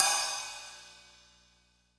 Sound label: Ride, Cymbals; Vengeance Samples